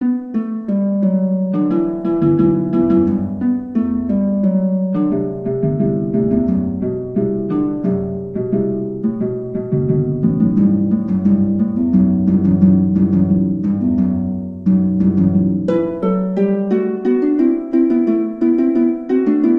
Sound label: Harp; The Bard's Tale Remastered Trilogy